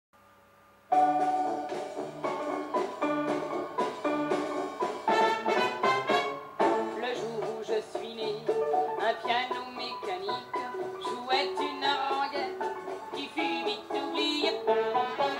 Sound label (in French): dixieland bastringue; 1957; rock; France